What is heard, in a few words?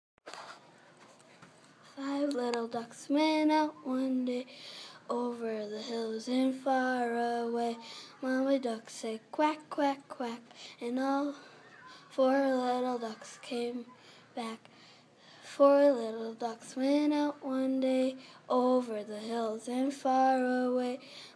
five little ducks